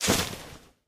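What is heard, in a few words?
inventory items generic; STALKER; IWP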